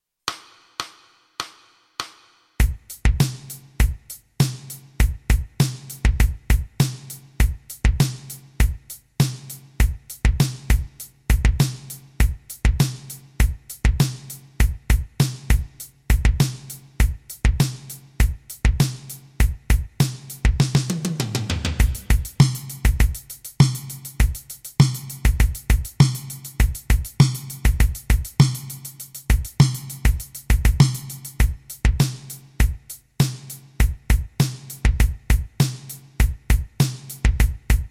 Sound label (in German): rock etuede 100